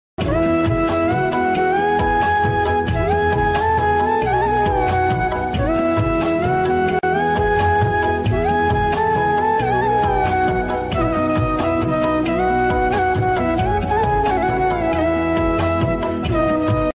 flute ringtone devotional ringtone instrumental ringtone flute bgm; ganesha